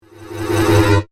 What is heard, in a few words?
Electrical Crescendo, Science Fiction; Sci Fi Sound Effects; Blast Wave